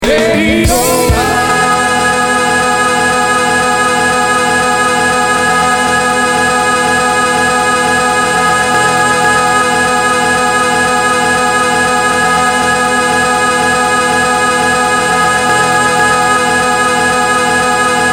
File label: ringtone